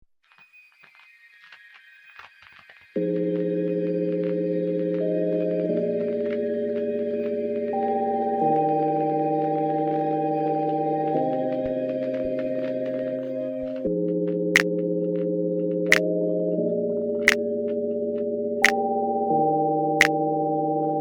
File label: Instrumental